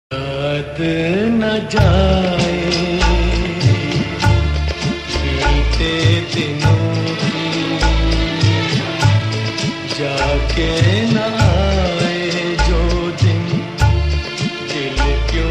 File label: Hindi old Sad Ringtones